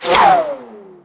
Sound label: ricochet; weapons; deadshot; dota; muerta